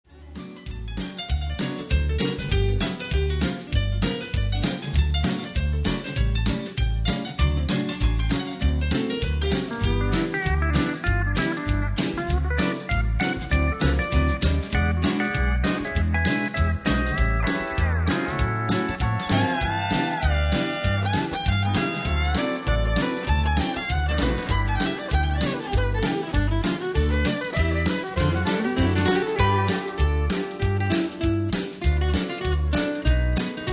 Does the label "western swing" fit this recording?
Yes